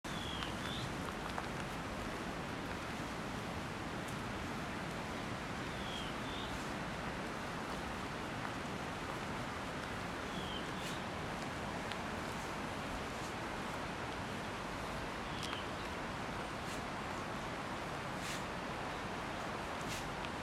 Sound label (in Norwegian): fuglen